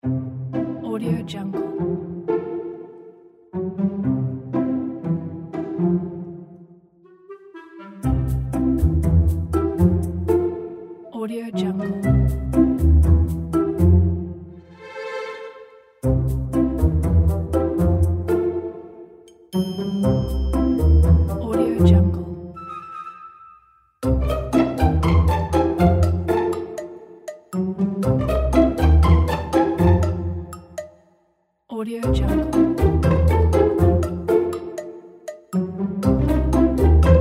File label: funny comedy